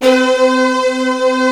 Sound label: F4, YAMAHA, Analog; USB Soundscan vol.49 - Analog Fantasies; AKAI